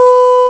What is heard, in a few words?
vox; CMI